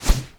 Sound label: Quick Whoosh